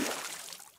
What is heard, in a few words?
Splash